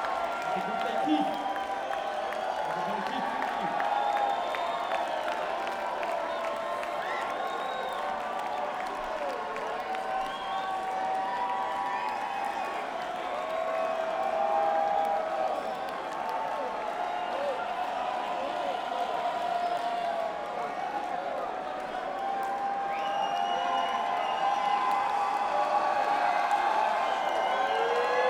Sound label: Crowd